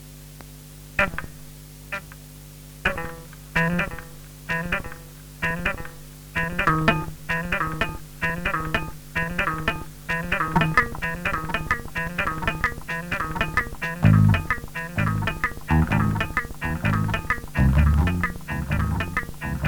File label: Bass Loops